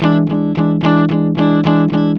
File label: ROCK E 110, Vintage Blues Guitar; USB Soundscan vol.22; AKAI